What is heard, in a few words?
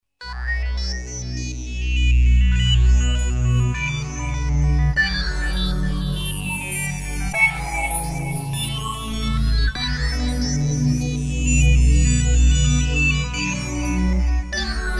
ambient